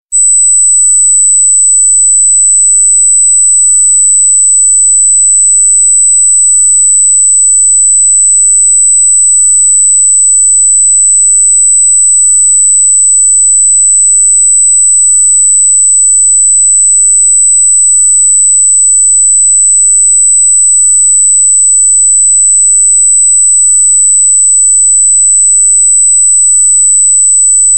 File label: 8000 Hz